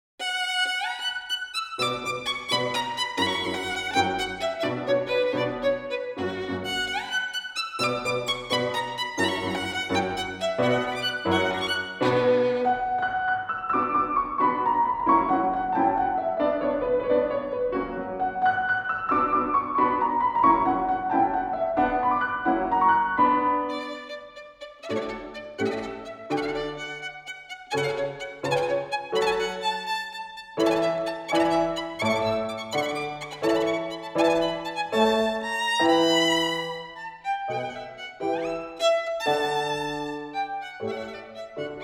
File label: Violin Concerto in B minor; Paganini; ＂La campanella＂